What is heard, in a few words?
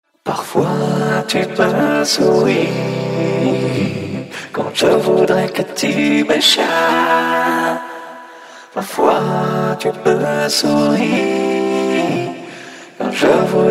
midi chords; The Mouth; Reaper